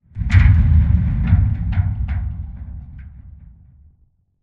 dish crane move start metal stress; Titanfall 2

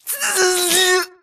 grunt electro; Titanfall 2

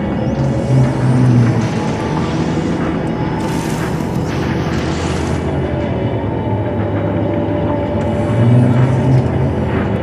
Robot Arm Track Med Mid; Boomtown; Titanfall 2

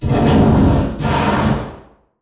Explosion Rumble Interior Close Texture; Boomtown; Titanfall 2

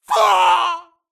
grunt death crush; Titanfall 2

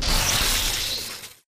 arc titan spark; Titanfall 2

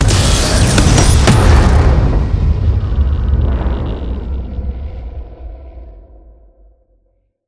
hotdrop to embark idle turbo short; Titanfall 2

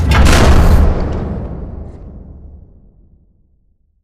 Prop Warehouse Giant Door Close Stop; Titanfall 2; Boomtown

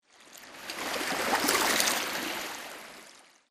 swampland water lapping; Titanfall 2

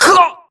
grunt death neck; Titanfall 2

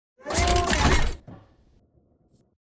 combat run to idle; Titanfall 2

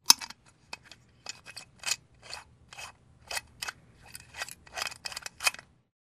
canteen; commander; baker; Titanfall 2

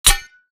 imp bullet light ballistic titan; Titanfall 2

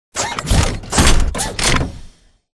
dash forward; Titanfall 2

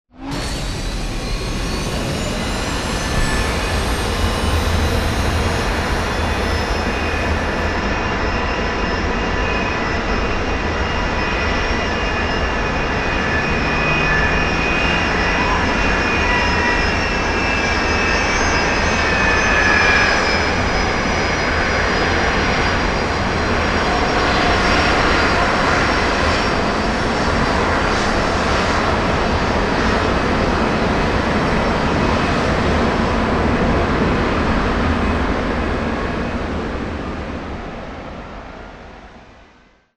small ship takeoff; Titanfall 2

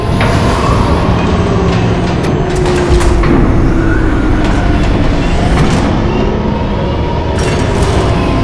Giant Floor Panel Arrive Servo; Boomtown; Sideways Town; Titanfall 2

